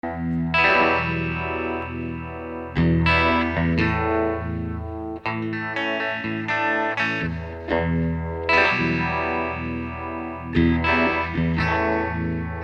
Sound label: guitar